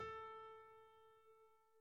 ding

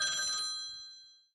bell

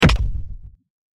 heavy attack

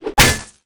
axe sound

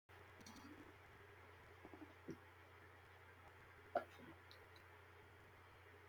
drinking water

